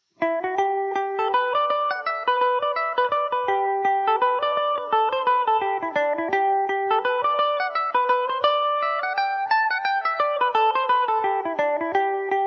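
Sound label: G major revelation, irish mandolin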